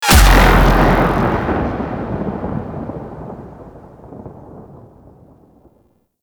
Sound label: Beam Fire; Gameplay; Mission One; Condrix; Warframe; The New War